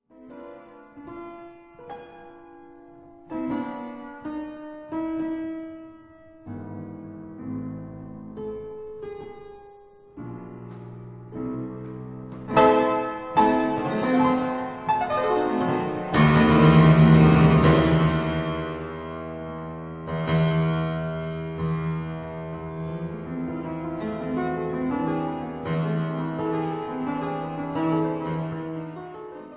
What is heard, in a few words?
Keyboard Sonata in A minor, Grave Moderato Energico; ed espressivo